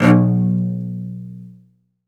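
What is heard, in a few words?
solo cello, strings; ILIO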